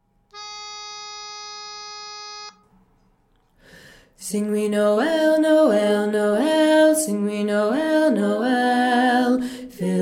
Sing We Noel Bass Dom

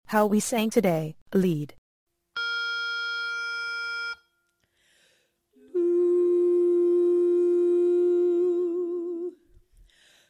How We Sang Lead

